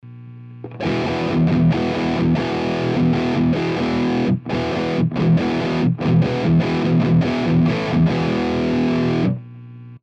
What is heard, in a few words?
Uber Cab, podhd